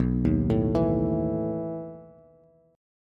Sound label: B 11th B down Bass; B11 arpeggiated; chord shape; JGuitar